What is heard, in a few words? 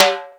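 timbale hi, GM percussion kit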